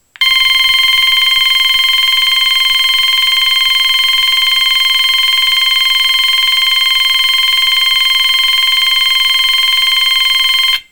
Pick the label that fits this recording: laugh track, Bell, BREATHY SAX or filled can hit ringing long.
Bell